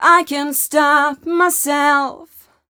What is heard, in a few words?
f mel d, fem Vocals; Mutekki Media; Diamond Vocals 2